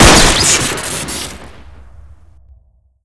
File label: revolver alt shoot crit; weapons; engineer; tf2classic